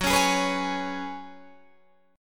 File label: F sharp Suspended 2nd Suspended 4th, Guitar DADGAD